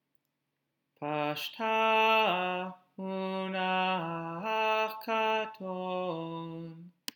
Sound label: Torah Trop Pattern